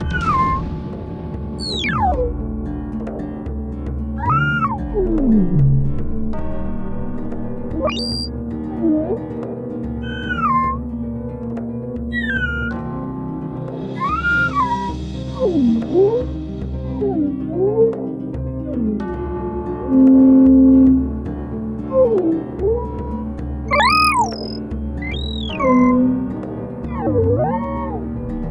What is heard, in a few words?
Mystery; adafruit circuitpython bundle; pyoa; cyoa titano